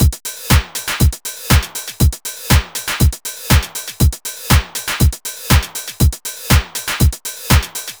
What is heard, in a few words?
120 trance beat, Drum & Perc Loops